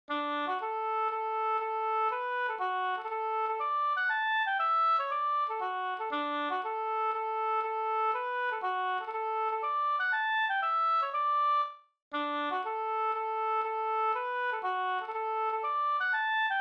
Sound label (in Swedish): hamburska; Leffler 8del Nr118